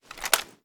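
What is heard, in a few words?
weapon foley assault rifle inspect; weapons; gmod; viper; scharlie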